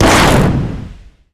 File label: Harpy Chain Lightning cast